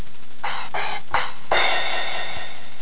CYMBALS

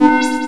WARNING

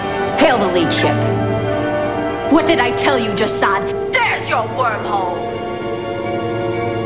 HAIL LEAD

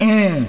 uuh

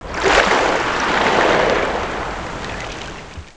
WATER

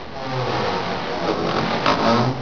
CREAK

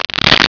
SHUFFLE, CARDSHRK

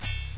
BING

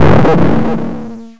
SHIP BUMP

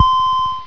TIMER

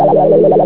time warp

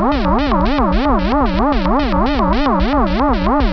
UFO HOVER

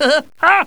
heheha

